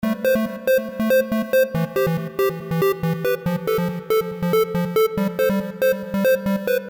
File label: square lead